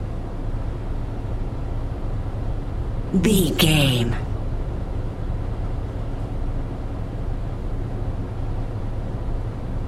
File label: background room interior hum loop